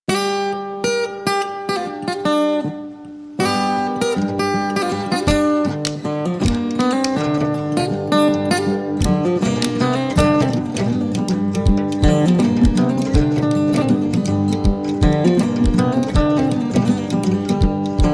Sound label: Acoustic